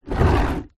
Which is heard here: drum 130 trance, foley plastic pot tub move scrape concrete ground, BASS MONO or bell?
foley plastic pot tub move scrape concrete ground